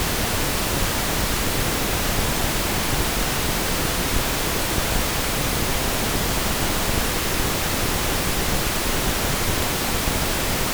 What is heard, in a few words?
Pink Noise